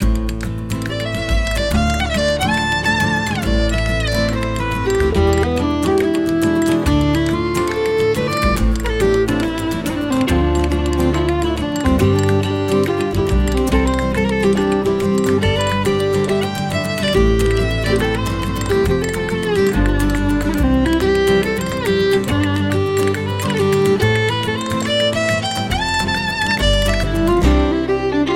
Irish Celtic Road to Limerick w Fiddle Solo 140 bpm